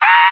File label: scream